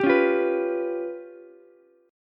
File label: C sharp 7th Flat 5th C sharp down Ukulele; C#7b5; strummed; JGuitar